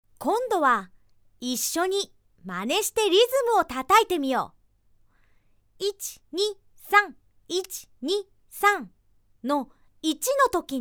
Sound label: walz